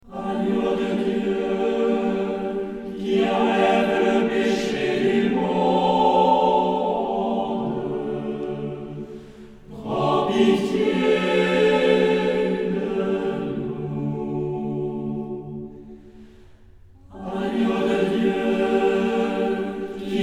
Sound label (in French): Carême Agnus Soprano